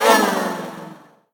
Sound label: clone death; Crypt of the NecroDancer